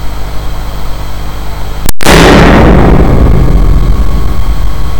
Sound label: beam On Coll; lhc collimation